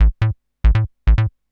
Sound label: TECHNO BASS 140 A